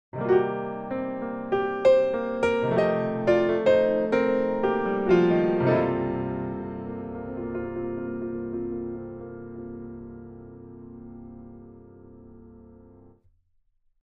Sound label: Jazzersized